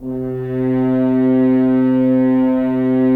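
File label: Solo French Horn; Roland - LA Composer vol. 4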